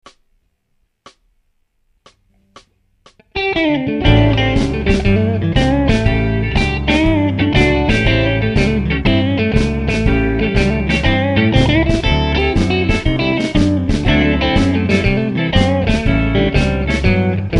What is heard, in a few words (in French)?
Style blues & blues-rock, PRS custom 22; guitare; Vive le blues